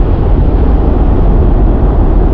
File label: cryo big pipe; Quake 4 Alpha; id software